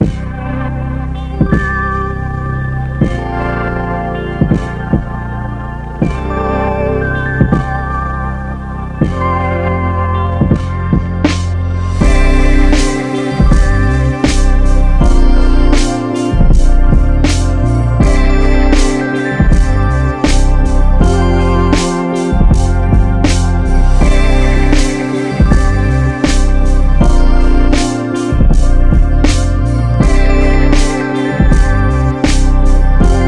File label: Lofi